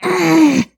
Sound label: voice female effort quick action